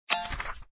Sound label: btn menu, button; mahjong ningxia